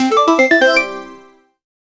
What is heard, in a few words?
a bright punchy news jing